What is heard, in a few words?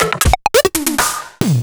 Percussions; Sliced